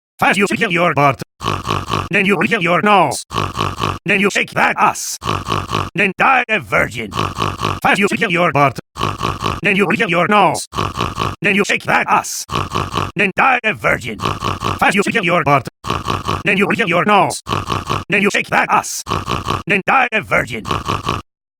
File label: SNORT SNORT SNORT (Acapella)